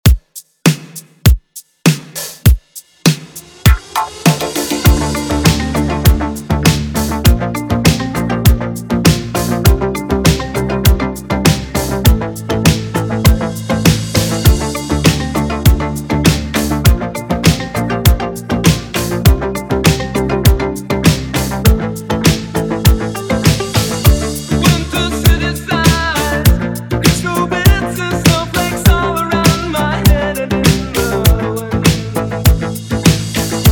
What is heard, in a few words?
100bpm, Intro Outro